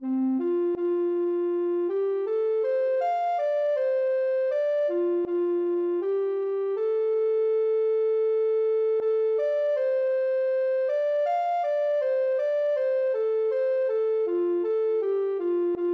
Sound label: ocarina